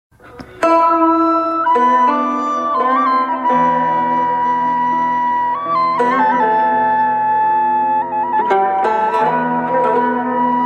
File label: flute